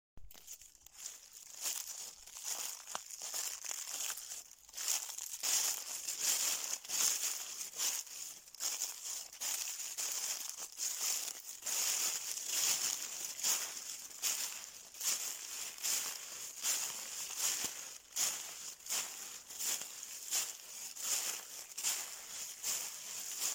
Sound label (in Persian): sedaye barge paeez, autumn leaf rustle